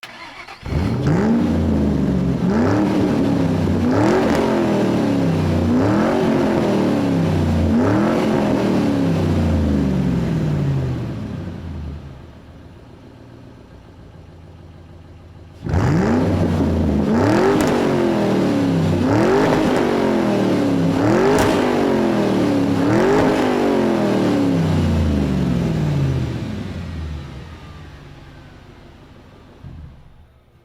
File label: engine rev continuous